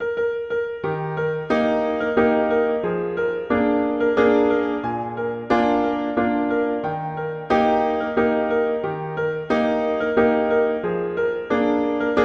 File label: German Song; Tchaikovsky